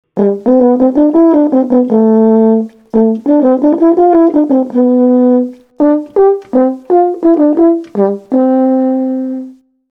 trombone tuba trompet hoorn

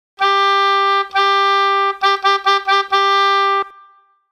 hobo ritme

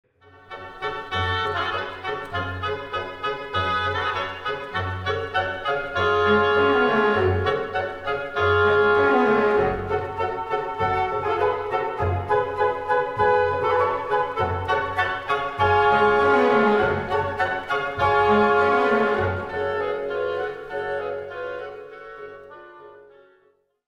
dansmuziek

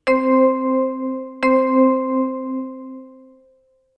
ritme

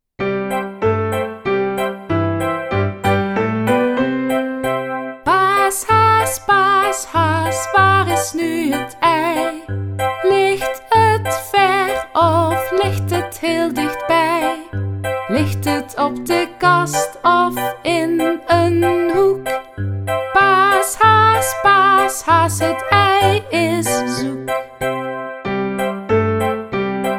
paashaas met zang

